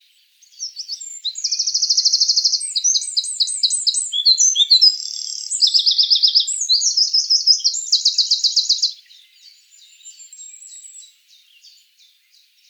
call, wren, full song, adult male, Background: Firecrest and Chiffchaff song.; Note the considerable length of the song phrase.; troglodytes troglodytes